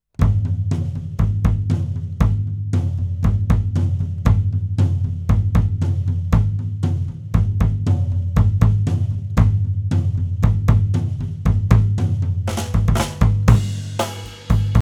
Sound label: Kick Rear Whole Kit